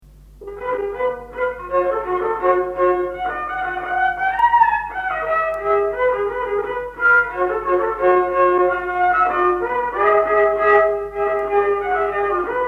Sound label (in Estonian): Polka, viiul folk; Valjala; Juhan Latt